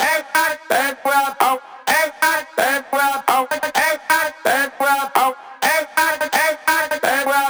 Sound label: House Loops, Vocal Loops House 128BPM